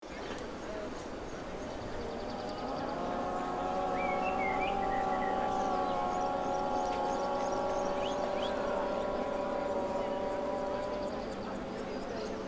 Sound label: Park atmosphere outdoor yoga class